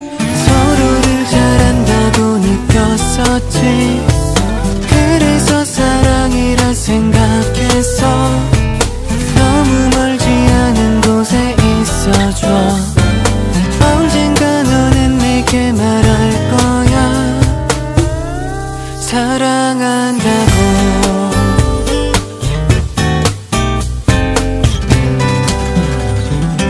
kpop

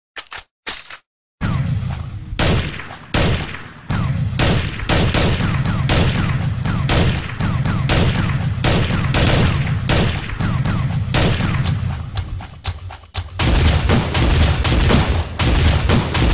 Counter strike gun sounds

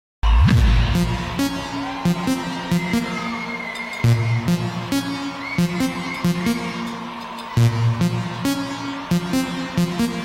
nokia bass boosted

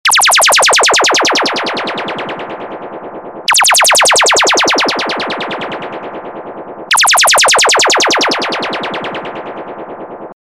sms message; tin nhan

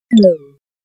oppo realme coloros low battery sound

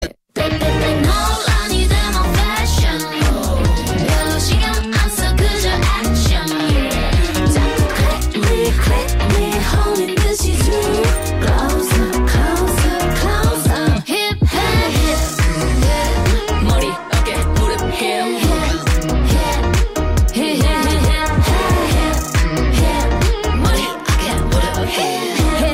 kpop

